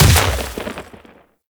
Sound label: Ice Proj Hit B; Warframe; Corpus; Arachnoid Camper Terra; Abilities